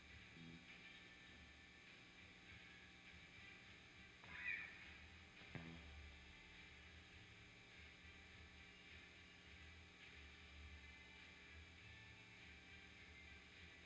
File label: guitar volume swell